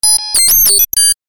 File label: science fiction computer glitch or malfunction